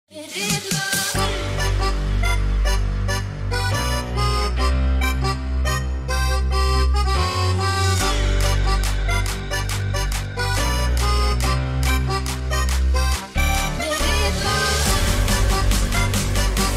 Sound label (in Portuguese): som automotivo